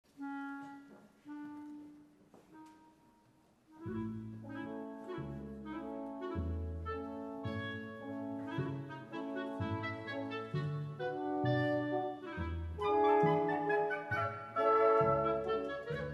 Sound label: hungarian dance